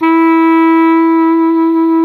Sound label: E 3, clarinettino; SoundBytes - Early Patches